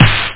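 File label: TECH SNARE; DRM PERC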